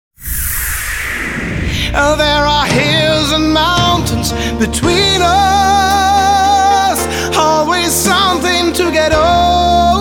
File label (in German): gesangstudio und live